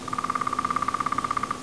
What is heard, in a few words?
downy drum; natureNavigator